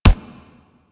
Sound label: Weapon Punch Rifle; Warframe; Weapons; Rifles